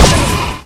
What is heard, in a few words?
stinger fire; scmod; ut99